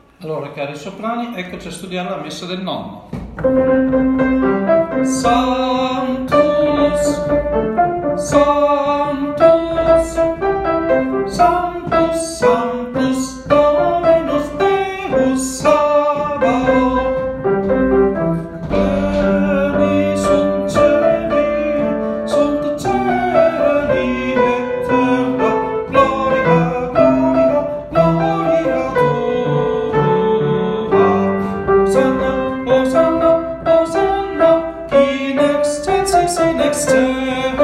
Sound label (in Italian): Sanctus Soprani; Santucci; Messa del Nonno